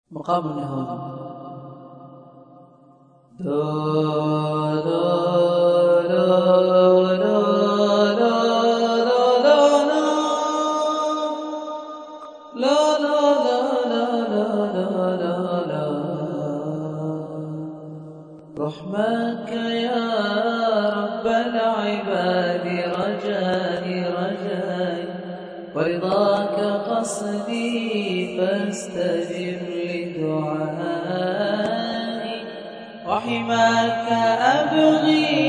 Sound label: nahawand